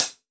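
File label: Standard Closed Hi Hat, Roland BK-5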